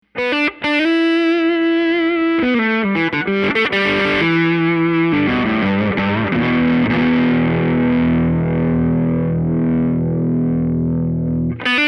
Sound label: Boogie; PRS McCarty